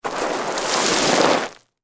Rocky Hillside, Footsteps, Slide, Down; BlastWave

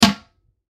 Toilet Seat Close; Bathroom; Household

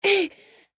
Grunt Breathe Male Seven Yrs Boy; Human; Blastwave

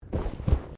Body movement Clothing Foley; Body movement foley to simulate falls and deaths; Dressing; Human

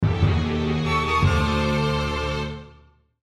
Orchestral Accent, Orchestral Accent Dissonant; Musical; Blastwave

